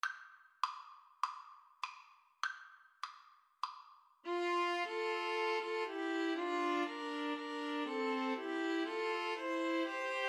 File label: String trio